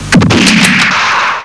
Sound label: winch